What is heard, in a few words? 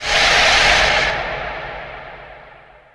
snared on